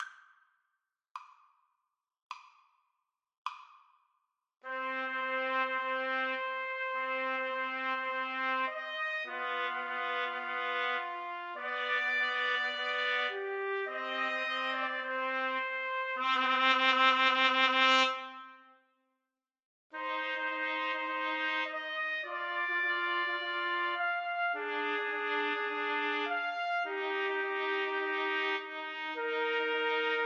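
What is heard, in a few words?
summer 2nd movement four seasons, Trumpet Trio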